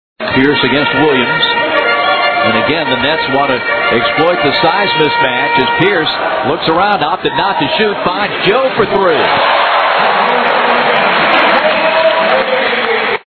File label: stadium Sound